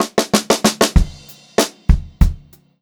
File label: FILL, Pop-Rock Drumloops; USB Soundscan vol.37; AKAI; 96 POP